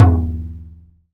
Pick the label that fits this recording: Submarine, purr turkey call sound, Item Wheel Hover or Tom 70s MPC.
Tom 70s MPC